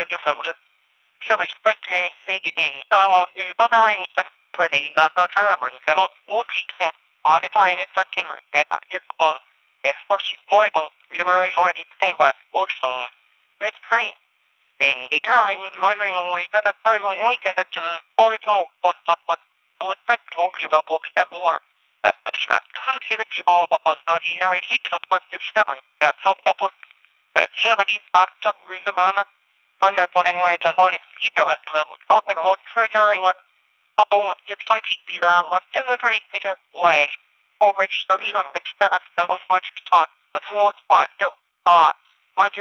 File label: application error